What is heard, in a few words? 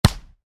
Volleyball hit hard, Volleyball (Exterior): Hit Hard; Sports; Miscellaneous Sports